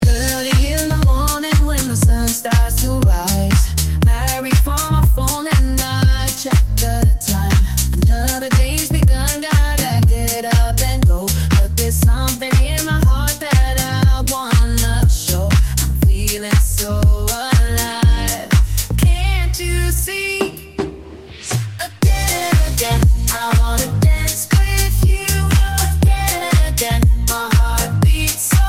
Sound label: upbeat melodic pop